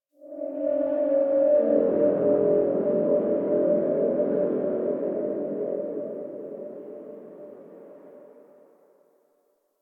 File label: Eerie Drone; Warframe; Ambience; Nineteen Ninety Nine